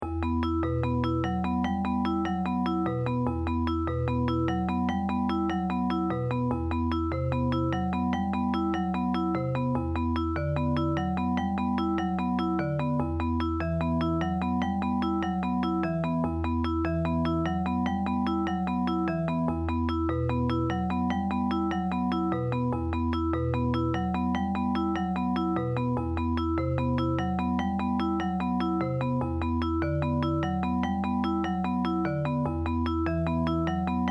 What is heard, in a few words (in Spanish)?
tango muy sencillo